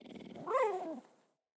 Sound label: Kubrow Puppy Idle Coo